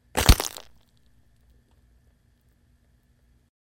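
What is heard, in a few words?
complex fracture with pressure on the body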